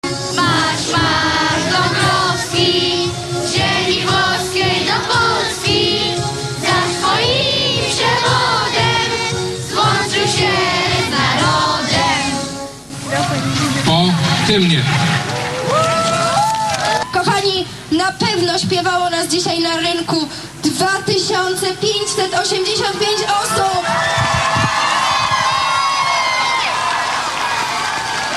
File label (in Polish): rekord w śpiewaniu hymnu; puck 2016